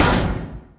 asteroid damage metallic small; Factorio

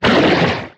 strafer warcry big, enemies; Factorio

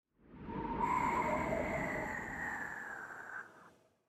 cold wind gust; factorio

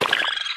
wriggler warcry, enemies; Factorio

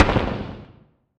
asteroid damage carbonic medium; Factorio

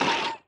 wriggler death; enemies; Factorio

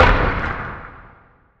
asteroid damage oxide big; Factorio

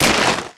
mined lichen tree, mining; ashland; Factorio

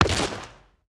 fly impact; enemies; Factorio; strafer

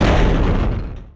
big mining drill moving stop; Factorio

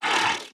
wriggler attack; Factorio

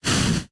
thruster inventory pickup; Factorio

